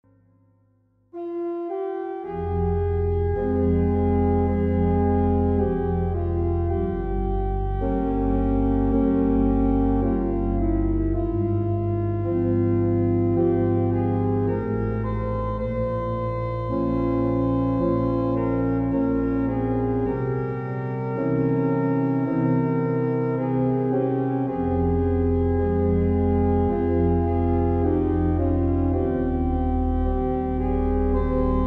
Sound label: adagio fa min reverb, organ